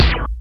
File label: TEK FX; MORPHEUS